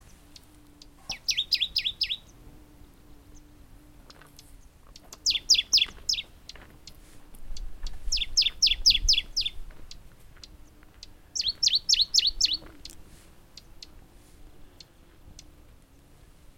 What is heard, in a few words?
warbler dusky Phylloscopus fuscatus